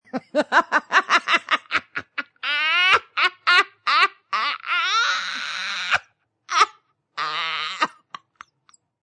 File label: Laugh Forced, Female laugh, long, becoming forced, (human voice); Laughter